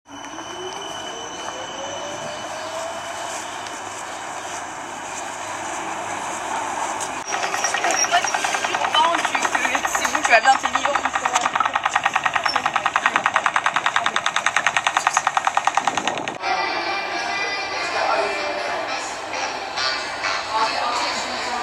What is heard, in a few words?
Amsterdam, sounds around the world